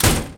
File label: DOOR, INDUSTRIAL